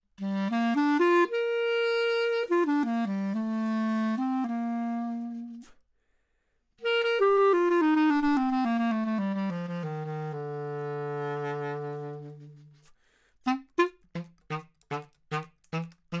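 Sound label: Clar Bb 20cm HighMid, clarinet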